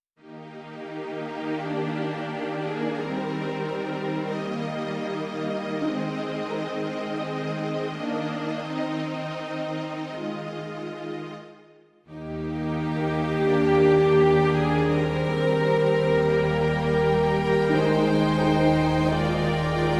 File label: Megavoice Strings